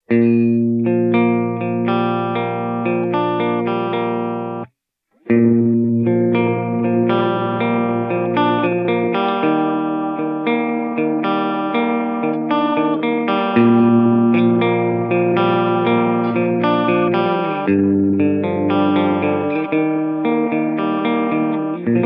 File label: Glitch Swung, reverb